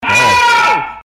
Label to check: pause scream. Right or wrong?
Right